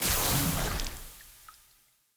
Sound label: Fusebox Fail; Puzzle